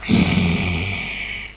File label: wolf; Little Red Riding Hood